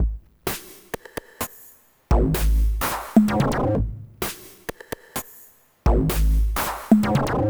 Drums Strange

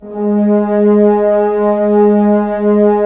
Horns; Roland - LA Composer vol. 4; HZ Horns

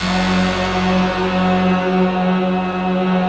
rough stack; Optical Media International; Sonic Images Library

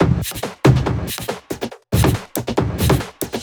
Loops 140 BPM Hihat Percussion Loops

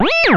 CLAV SEL; USB Soundscan vol.32; Roots Disco; AKAI

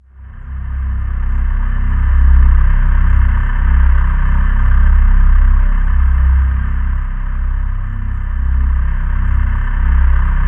C2, Synth Leads

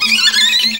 VIOLIN BIRDS; Best Service; World Colours

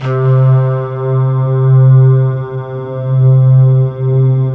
guitarine C3

